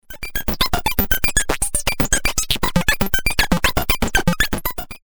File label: Pll rnd fm; experimental